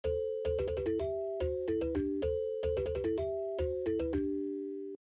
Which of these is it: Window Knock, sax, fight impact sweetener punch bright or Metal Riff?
Metal Riff